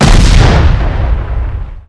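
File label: ROCKET; rrdemo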